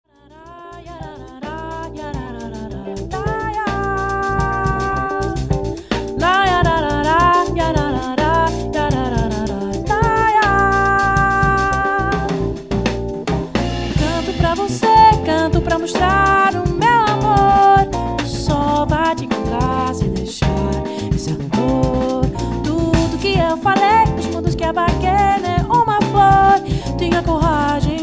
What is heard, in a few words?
AGOGO A4